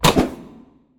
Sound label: arrow release and swoosh